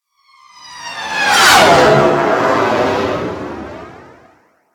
Ship Close Fly By, Engines; Warframe; Vehicles; RailJack; RailJack Enemy Ships; Grineer; Engines Generic

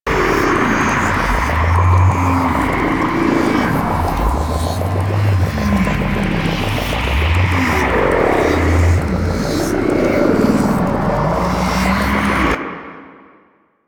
Portal Loop; Warframe; Dark Sector

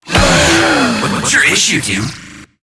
Infested Lich Hard Drive Pain

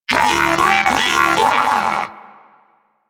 Moa Pet enemy spotted; Warframe; Pets; Tenacious

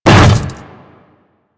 Hammer Boss Step Default, Footsteps; Warframe; Grineer

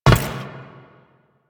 Step Metal, Footsteps; Warframe; Teshin

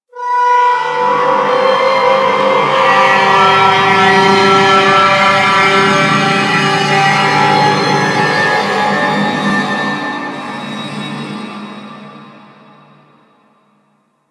Space Trench, Ambience Space Battles; Warframe

